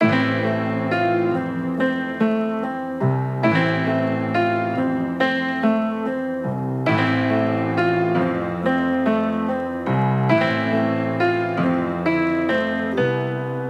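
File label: macabre piano 70 A minor; candelabra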